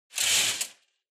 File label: Curtain